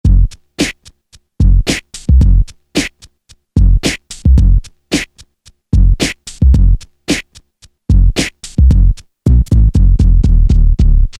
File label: Jeep Beat; Alesis Rap Techno Dance